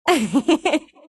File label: laugh; dota; crystalmaiden